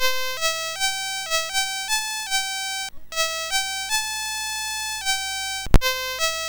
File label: Commodore Users Guide PAL BASIC, MOS6581R3